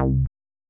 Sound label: Bass; 911